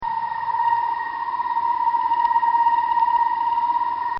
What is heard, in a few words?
Lazer Modulations; Lasers; Multimedia